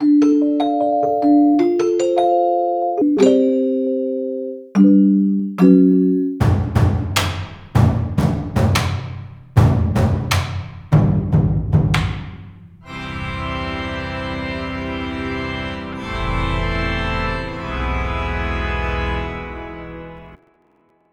midi guitar instruments; eastwest midi guitar instruments test

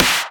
Snare

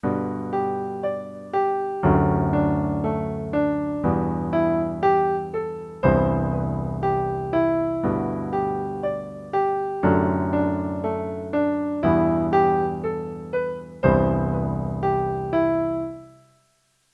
piano, Startone MK-200

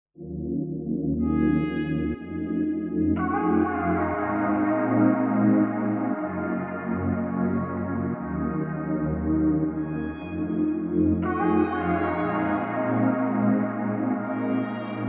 trap soul

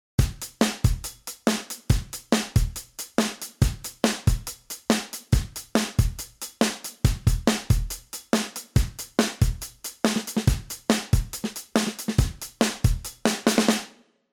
drums clean; elysia mpressor

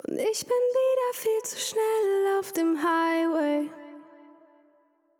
delay; Pop Vocals abmischen

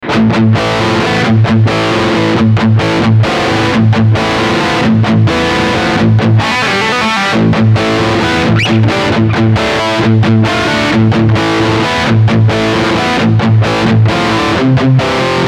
vox tone garage v8 rhythm

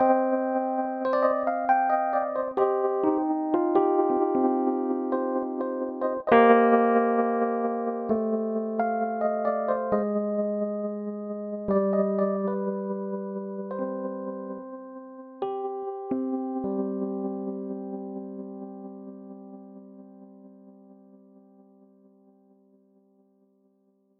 yamaha mx61 e-piano

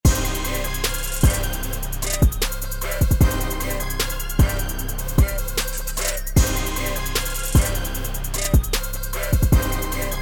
trap; akai mpc x

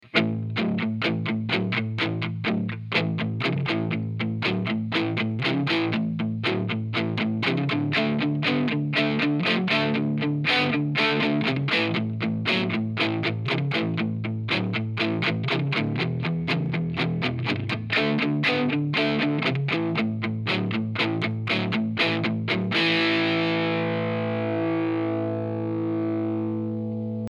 rock n roll british 8000 crunch; ik multimedia amplitube 4

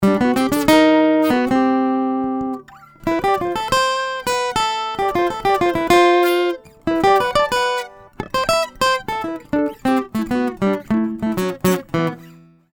Pitch Shift; zoom a3

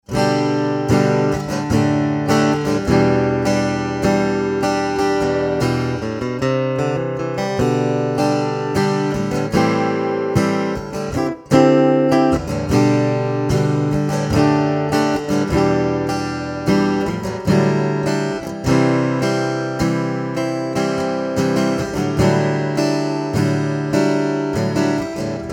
baton rouge fjec cherry

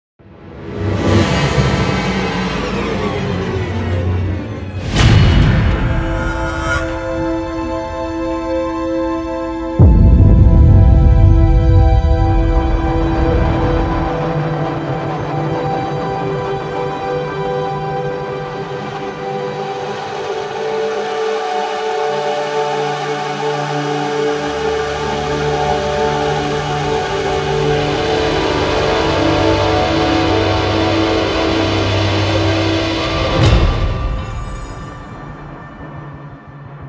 orchestral essentials sounddesign; ProjectSAM Orchestral Essentials

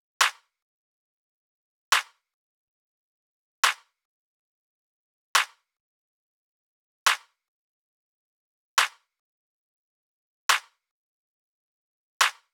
clap loop dry; JMG Sound Mirror

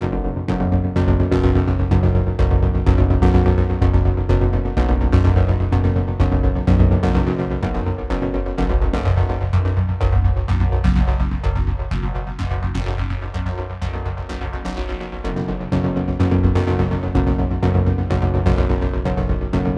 synth; delamar mystery synth

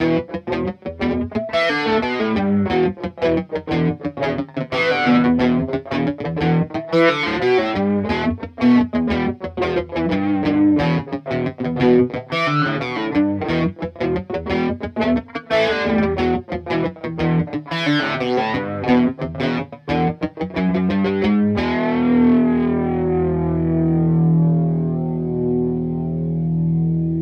OX Amp Top Box test Orange 1x12 blu 15 flanger; universal audio

